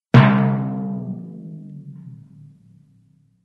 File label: Timpani